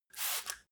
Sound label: spray bottle single; haus garten